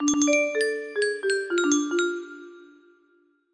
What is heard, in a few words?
generic melody, music box